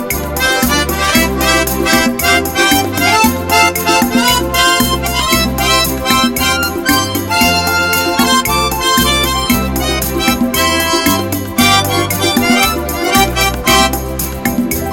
Relaxing Beguine

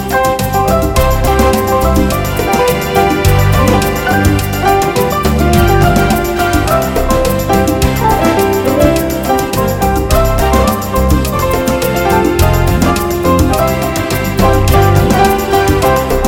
A Happy Mambo Party

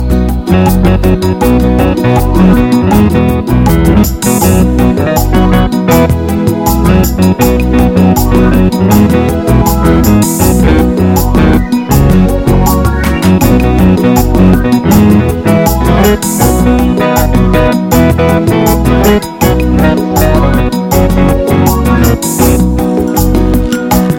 Beautiful Latin Sounds

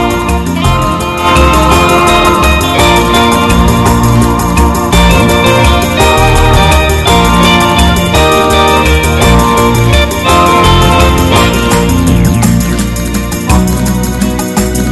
Fast and Happy

